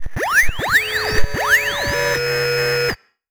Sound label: Incoming, 8-Bit; Games And 8-Bit Kit Volume2; atomsplitteraudio; chiptune